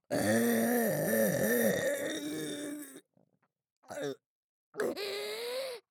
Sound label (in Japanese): ザーメンゲップ（大）; ザーメンゲップ集